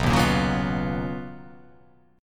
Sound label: B Major Sharp 11th